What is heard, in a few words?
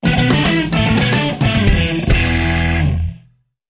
Rock